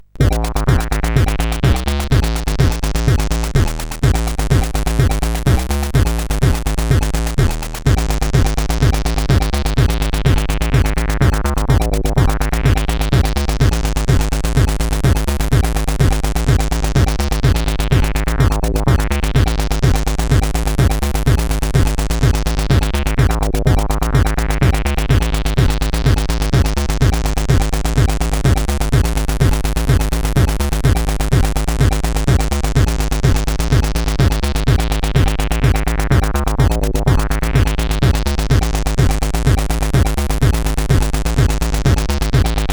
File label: Acid, CSG8580R5